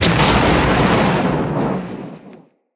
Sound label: Eruption Radial Fire; Sargas Ruk